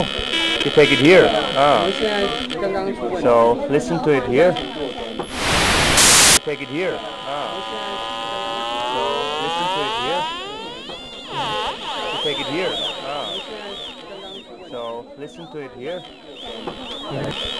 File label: about noise; radio work